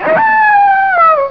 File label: HOWL; ANIMALS